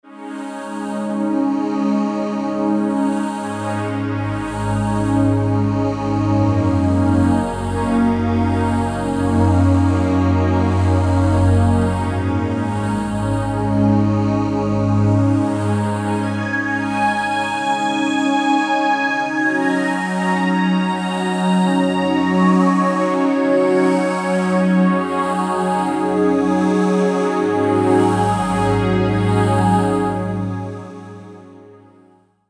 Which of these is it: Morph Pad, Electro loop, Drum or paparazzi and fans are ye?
Morph Pad